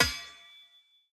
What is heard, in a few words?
Sword Clang; soundscrate